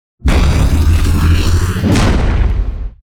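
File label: thunder spirit attack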